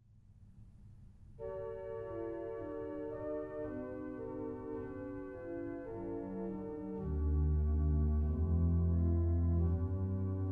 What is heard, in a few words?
chords